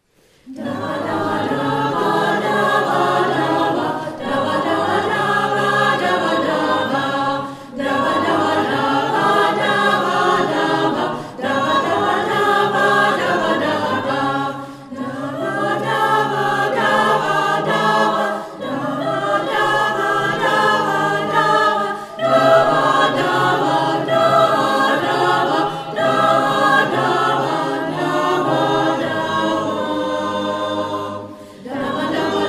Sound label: Tambourin